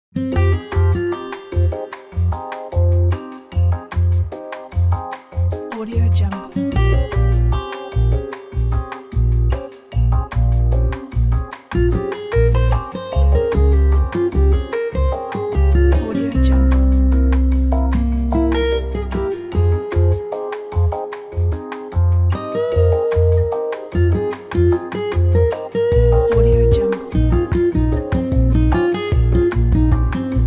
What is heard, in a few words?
lounge acoustic guitar theme